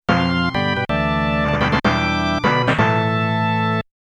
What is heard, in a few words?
Level Clear Fanfare